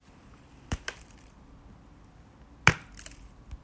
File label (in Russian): dve buhanki testa na zakvaske lomayutsya udaryayas drug o druga kak tarelki sozdavaya zvuk; zvuk bochki